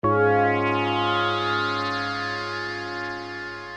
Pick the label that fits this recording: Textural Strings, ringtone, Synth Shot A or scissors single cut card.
ringtone